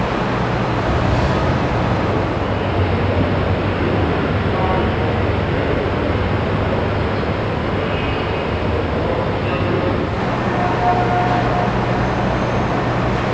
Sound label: station ambience loop